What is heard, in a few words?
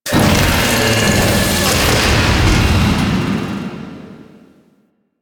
Dropship Doors Close; Warframe; The New War; Narmer Outpost